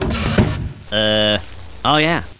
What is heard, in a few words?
air button